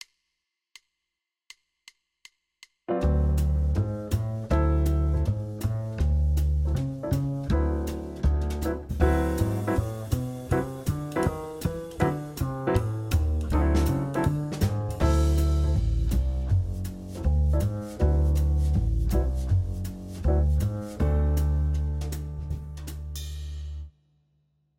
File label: D mixo 160; voodoo horns